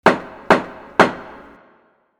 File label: gavel